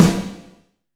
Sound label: WET WOOD, SNARES; Back In Time Records; Big Bang